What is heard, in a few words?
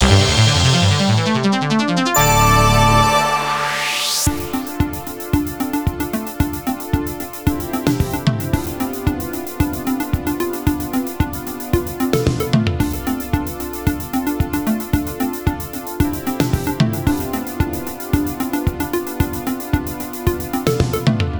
Win Fanfare; Stikbold! Dodgeball Adventure Deluxe